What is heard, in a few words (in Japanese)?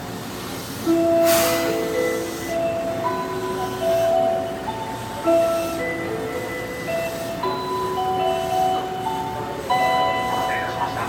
出町柳北, train sounds; 叡山